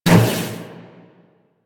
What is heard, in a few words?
Guardian Shell Impact; Warframe; Focus; Vazarin